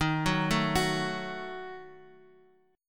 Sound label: Eb Diminished, Guitar, Open F; Ebdim arpeggiated; x,x,3,1,0,1; JGuitar